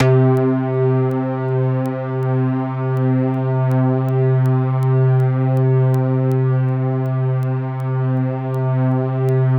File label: C2, SynthLine; 90 s Sample CDs; NiceBeats; SynthLine VOL-20